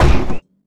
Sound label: heavy wood, footsteps; player; heavyfeet; Dystopia